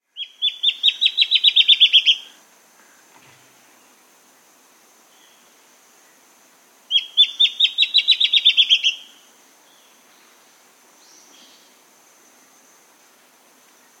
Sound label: Black-Chinned Antbird, Nature's Songs, S. America